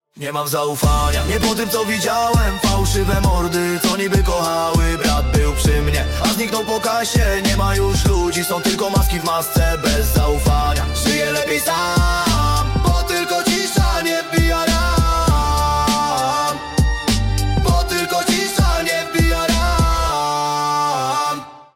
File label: ringtones